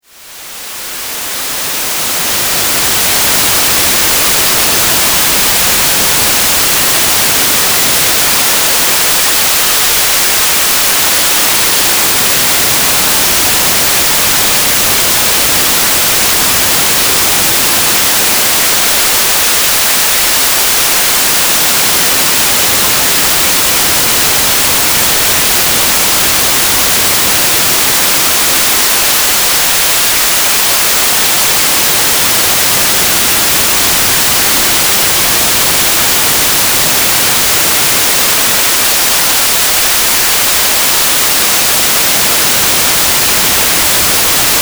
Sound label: white noise with wave